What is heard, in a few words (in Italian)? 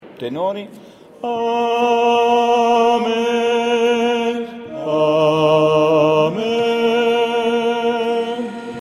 AMEN Ten Can; Visita Arcivescovo